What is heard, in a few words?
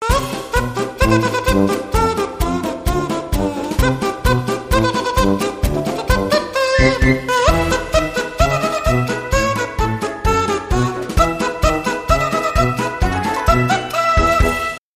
Rubberkazoo Band